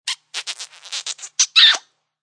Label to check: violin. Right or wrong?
Wrong